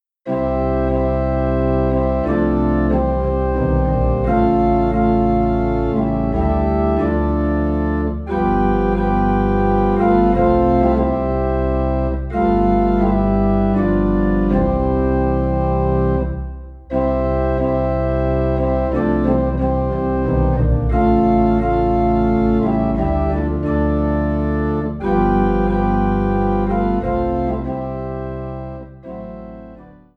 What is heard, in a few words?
Organ Low in C